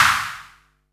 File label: Percussion; Vengeance Samples